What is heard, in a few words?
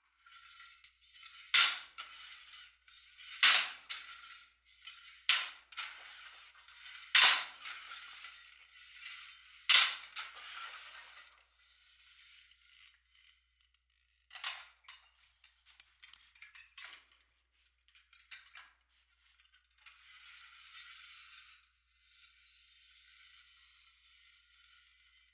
Gym; Weight Machine Pulley Movement And Metal Impacts From Weights.